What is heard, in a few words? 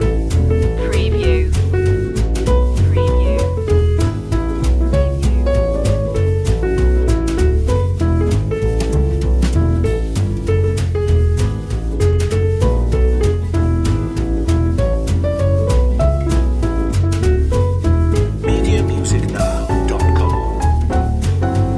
jazz long loop; we wish you a merry christmas